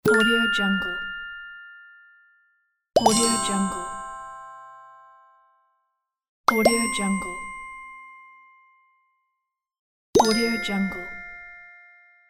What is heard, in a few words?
the correct answer